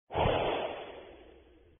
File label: game over